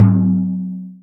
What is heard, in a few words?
FRAME DR, AFRICAN PER; AKAI S6000 CD-ROM - Volume 1; PERCUSSION